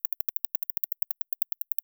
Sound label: Myotis dasycneme; europe